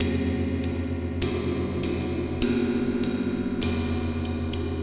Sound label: Wind Gong Cymbal Brushes, Ambient Metals; Sonic Foundry (Sony Creative Software) - Ambient Atmospheres & Rhythms